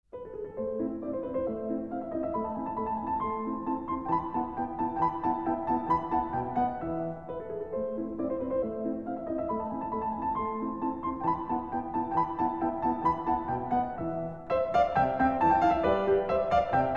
Piano Sonata No. 11 in A Major, Alla turca III. Allegretto; Mozart; Op. 6 No. 2, K. 331